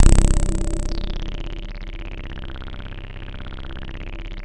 JV SYNTHACON; VINTAGE-EXP

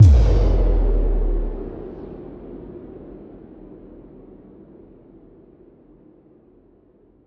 Impact; impact samples

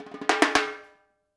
FILL, Percussion; InVision Interactive; Percussion Slam; PROGFROG

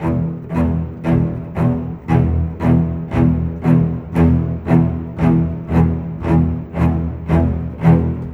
Cello, Cinematic; Sonic Foundry (Sony Creative Software); Orchestral Series VOL-3 - Cinematic

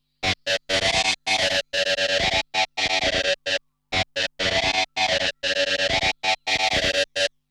SIREN; 90 s Sample CDs; Zero G - Funk Guitar

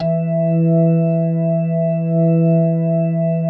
E3, PERCORG; Sonic Reality; Vintage Timetraveler

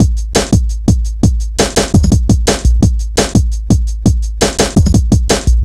170, Drum Loops, Drum & Bass

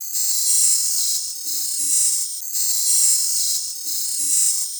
Insect Network, Environments; Sonic Foundry (Sony Creative Software); Myths of Technology

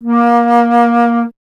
Alto Flute

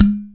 OPEN TOP, UDU

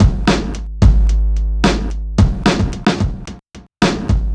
Noise Beat; Drums & Drones

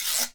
guiro, Synclavier Percussion, World & Orchestral; ILIO; Synclavier Percussion 2

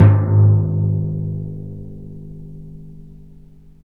PRC TIMPAN, Timpani Dry; Roland LCDP03 Orchestral Perc

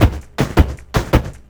LOOP, 160 BPM, Drum & Bass

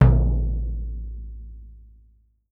MD METAL, percussion; Voice Crystal - Steve Reid Definitive Percussion

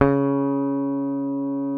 C#3, Bass; Sampleheads; Will Lee Bass Library

